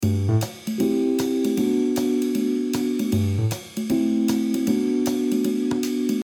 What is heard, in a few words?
Short mistery